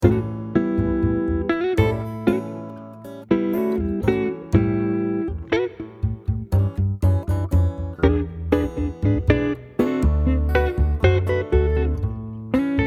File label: Funky Groove solo, gitarren